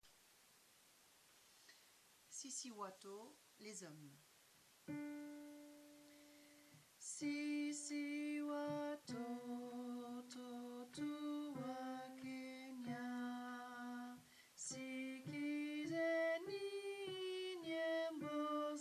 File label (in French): sisi wa to hommes; kenya melodies